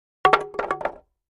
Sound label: 2x4 Plank Dropped On